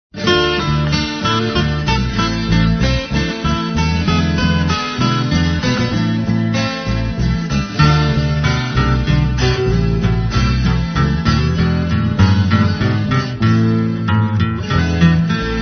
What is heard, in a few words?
tiple